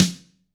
AMB SN, AMBIENCE SNARE

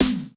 Snare, Yamaha RY20

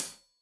Hat Tight, Hi-Hat; Deeply Sampled Drums

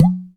Udu Hit Pop; Sonic Foundry (Sony Creative Software); Joe Vitale Latin Percussion

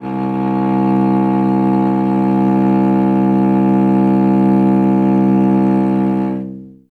Cello solo C1; Best Service ProSamples vol.51 - Classic Orchestra 2

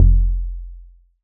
BAS F1, Yamaha TX81Z synth bass

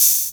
CR-8000 KIT; Rubber Chicken Software Sample Bank; DRUMS + PERC

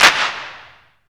PRC CHOIR, Claps&Snaps; Northstar - Drumscapes Roland; Bonus Perc

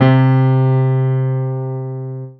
PIANO, Dance Mega Synth; Best Service

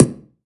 BD, BASS DRUMS; EdgeSounds

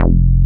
TEK F2, TEK BASS; Drums In Your FACE!

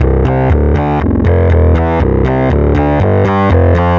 Hidden Bass, Bass Foundations; Sonic Foundry (Sony Creative Software); The Electronic Music Manuscript Collection

